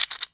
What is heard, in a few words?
hold gem